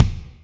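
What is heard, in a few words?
kick 24' Room, Alesis D4; 300 Drum Machines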